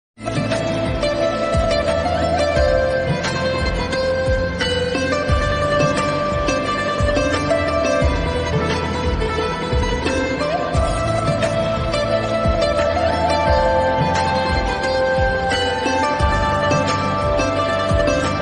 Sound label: sad ringtone emotional bgm ringtone sad emotional